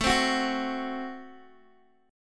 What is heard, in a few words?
A Minor 6th A Guitar Open D